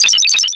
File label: Metals Kick